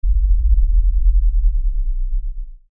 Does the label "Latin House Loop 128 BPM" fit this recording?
No